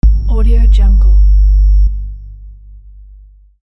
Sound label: insert dubstep